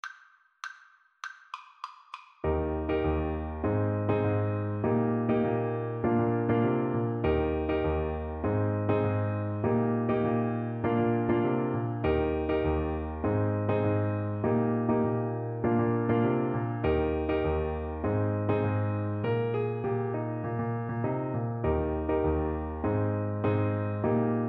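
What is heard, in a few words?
alto saxophone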